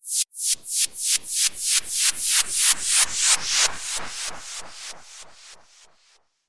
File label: Pulsing Drop